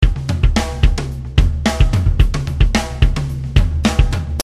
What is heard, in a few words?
Goatskin Groove